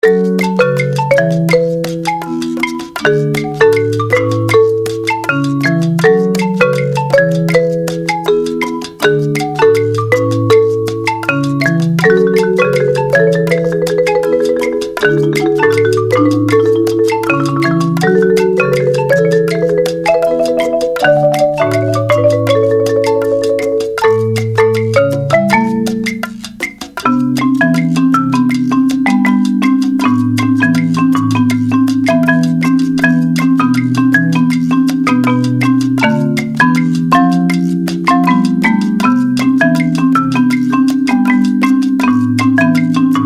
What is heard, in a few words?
salsa